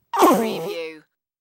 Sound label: Baby Exhale